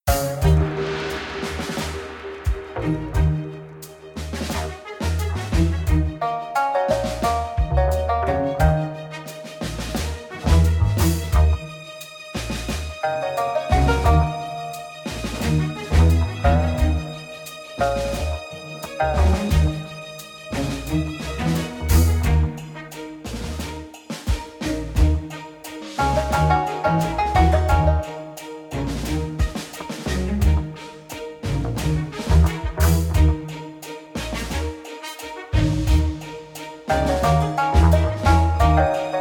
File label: ninja military; ukrainian ninja windows gamerip 2014